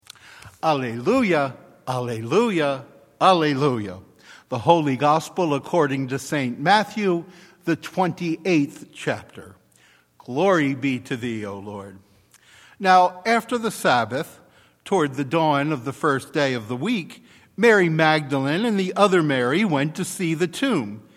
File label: Alleluia - Holy Gospel; Easter Sunday